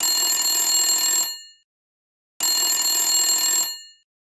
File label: Vintage Telephone; Honor Magic 7 RSR Porsche Design; ringtones